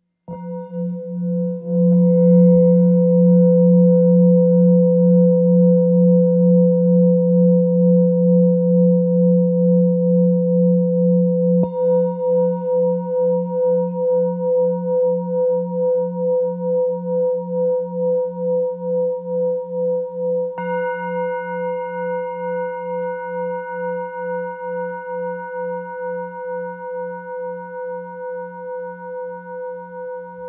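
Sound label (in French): Bol super lune belier fa, bols chantants tibétains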